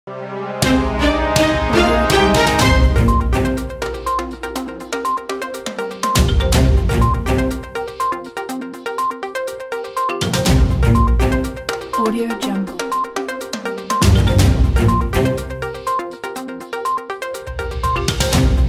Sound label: News Hour Broadcast News Package; After Effects template